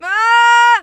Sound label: fear